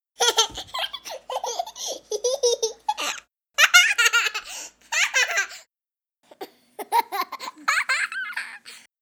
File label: child laughing happy; emotions sound story